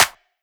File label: clap tight; Hip-Hop; Drum One Shots; SD CAICOS Hip-Hop Sample Pack; Slate